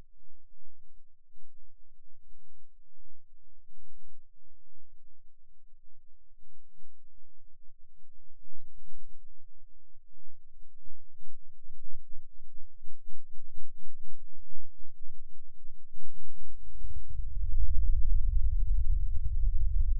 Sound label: Rhythm Seizure, eeg